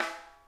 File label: RIM; SNARE; Sonic Reality; Interactive Drum Kits; ID SNARE 9+